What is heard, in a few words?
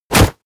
hit armor leather